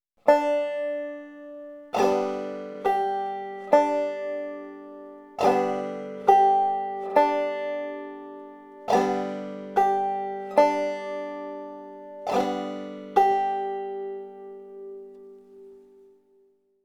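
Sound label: bum ditty, banjo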